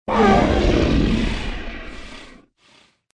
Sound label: ape